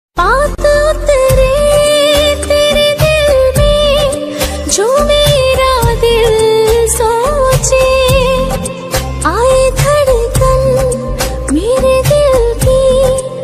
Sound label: Ost pakistani ringtone